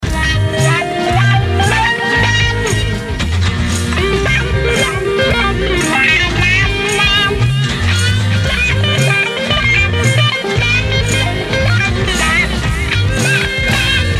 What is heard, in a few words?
wah pan; effets